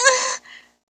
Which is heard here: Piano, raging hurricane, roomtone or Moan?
Moan